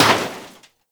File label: Scooter Impact; Warframe; Ambience; Nineteen Ninety Nine